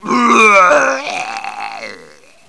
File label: death; cstrike; impulse